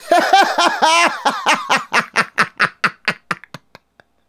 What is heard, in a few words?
Male Laugh; Laughs; Sonic Foundry (Sony Creative Software); Vocal Toolbox